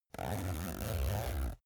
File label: zipper use